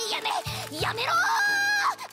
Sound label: failsound; AngeLMegumin reworked v1.3